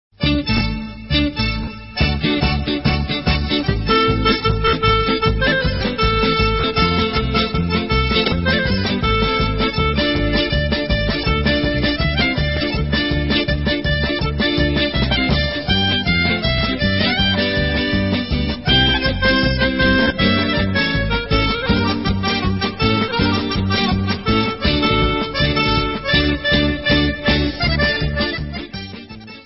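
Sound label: Instrumental, Israeli Dances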